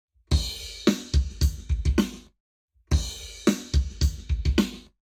Drums, 0to360deg; Lab Wet

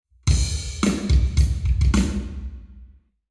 Hall, Drums 0deg; eMagLS

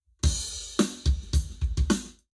Lab Wet Drums 90deg; eBreve

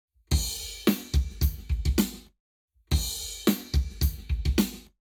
Lab Wet Drums 0to360deg; eMagLS

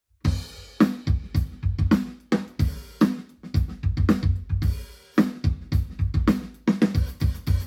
drums binaural

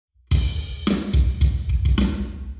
Hall Drums 0deg; eMagLS

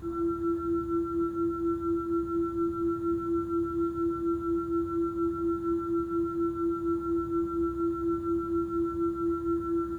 Two-Tone AVAS, electric vehicle

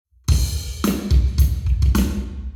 Hall KEMAR Ear Drums 90deg

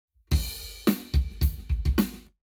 Lab Wet Drums 90deg; eMagLS

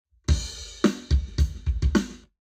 Drums, 0deg; Lab Wet

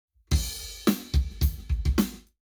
Lab Wet, Drums 0deg; eMagLS

